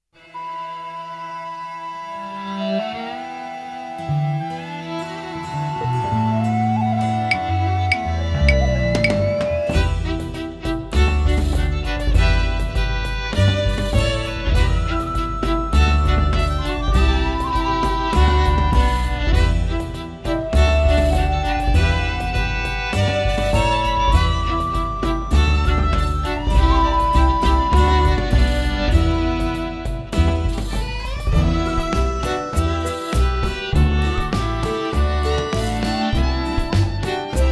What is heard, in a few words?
Hornpipe, violín